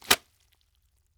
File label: Zombie guts Wet Chamois; Doom; Zombies